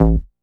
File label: Bong Bass; Big Fish Audio; Old Skool House; OSH Bass